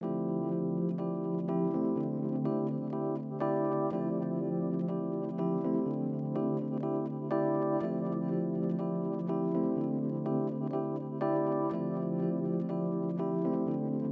Smooth Electric Piano Loop 123bpm

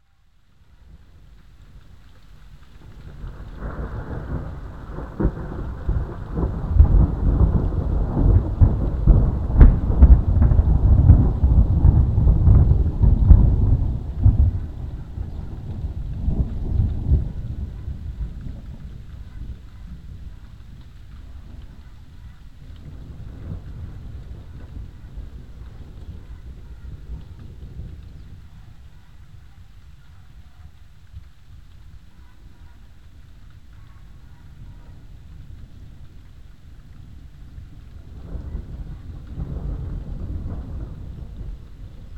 Thunder rumble thunderstorm

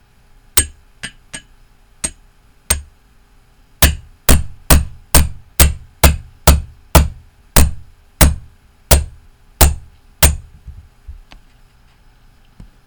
Hammering

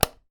Light Switch On

